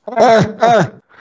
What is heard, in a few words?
ugh ugh